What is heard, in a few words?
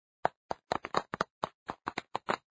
finger tapping